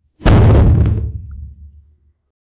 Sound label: loud thud running into